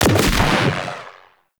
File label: Space Fighter Dredger Jelly Rocket Fire; Grineer